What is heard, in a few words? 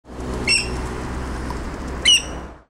Weka